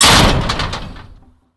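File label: destruction news stand impact; Explosions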